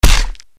axe light flesh; conan

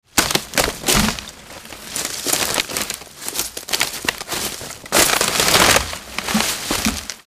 Wood Pile; How to mic a pile of brush: Position stand away from possible falling limbs, press record, jump up and down, bonus points for falling through brush and into squirrel nest.; North woods of Wisco

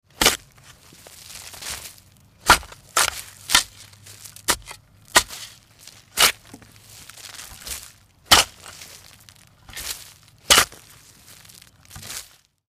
Shovel; Nothing fancy, yo heave ho. The rocks and leaves make for an added level of detail, along with the dramatic flinging of debris.; North woods of Wisco

